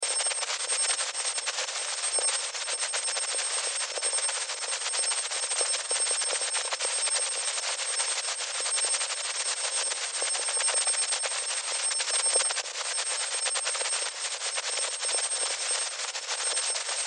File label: Sci Fi Static Radio Hum Buzz High Pitch Whine; Communication